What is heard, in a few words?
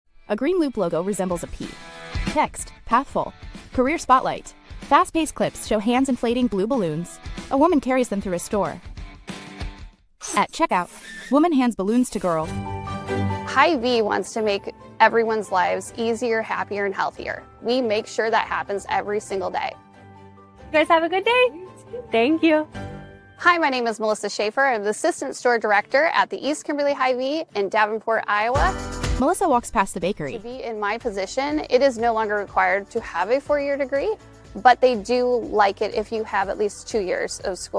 media sad audio